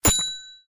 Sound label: hit good